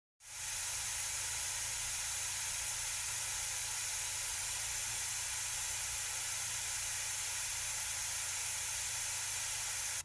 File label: Blue Noise Sample